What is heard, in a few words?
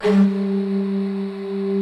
G2 L, LEAD; Music Store - Claudius Diemeter Schwerlos